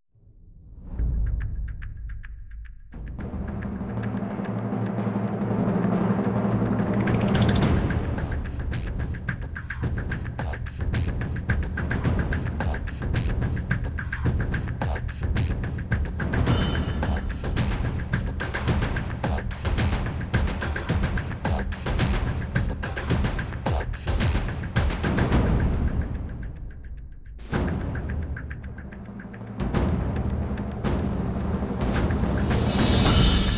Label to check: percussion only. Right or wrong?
Right